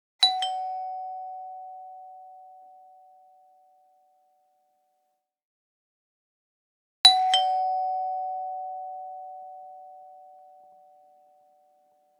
Ding Dong

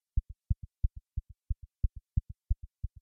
Heart 0hz

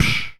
Human snare

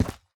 Steps Dirt

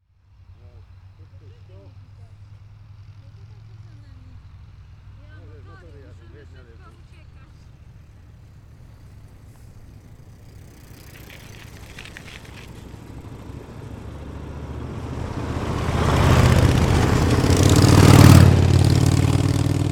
Motorcycles Forward Bicycles On A Field Way

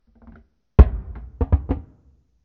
Boom With Bouncing

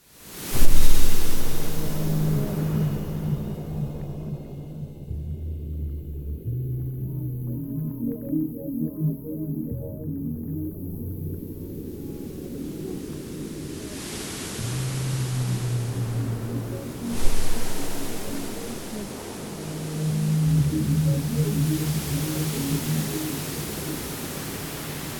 Water

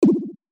Hit Modulated Fx

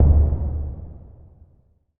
Verb tom

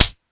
A Slap Or Smack In The Face

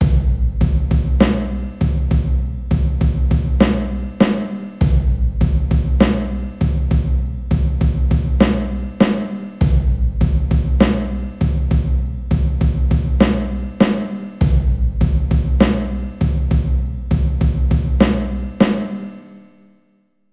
Drum sequence 100 Bpm

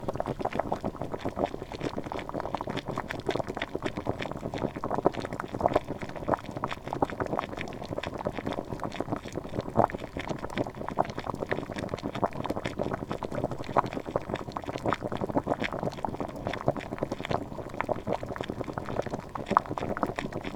Boiling Towel Loop

